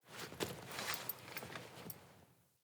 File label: Foley Actions Default Stand, Default Clothing; Player